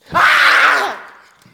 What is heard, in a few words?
scream